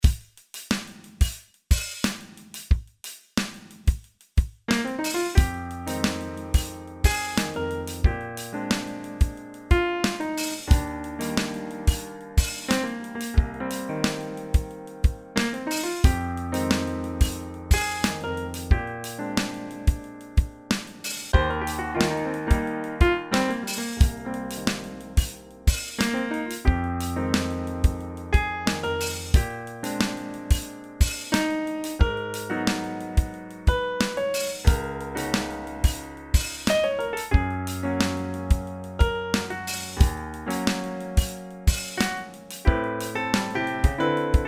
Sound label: piano